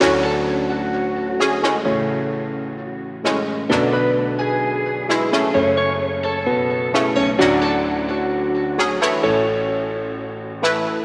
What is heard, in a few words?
electro